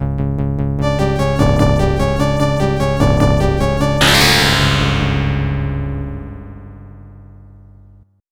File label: arcade title; alien syndrome